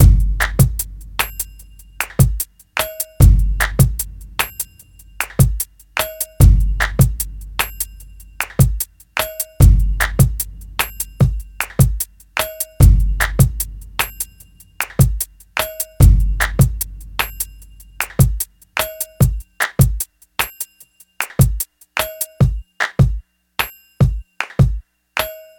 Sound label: Kitchen Groove